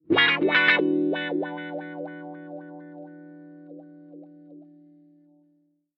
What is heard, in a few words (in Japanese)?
ワウギター